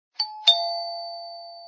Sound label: notifier triple; INOI